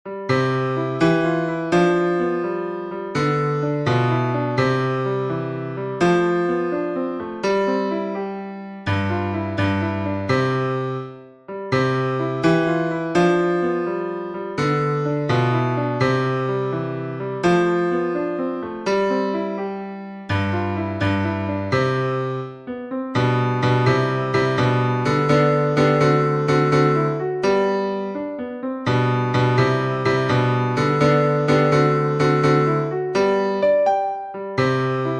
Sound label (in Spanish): marcha, guitar